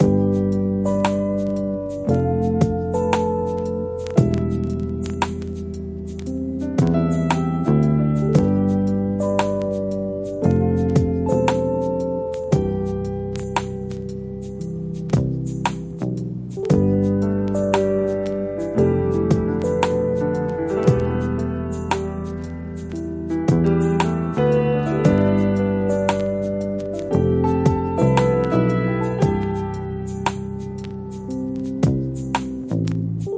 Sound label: wood background music